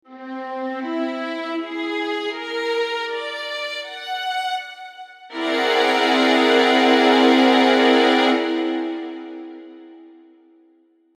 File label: chord st aug7+11